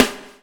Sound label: BUBL, BUBBLE; Spectrasonics - Backbeat